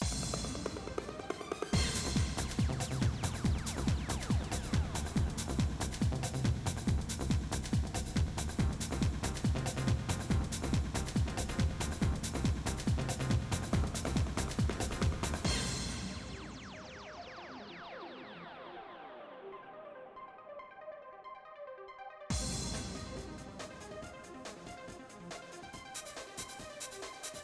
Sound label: Party low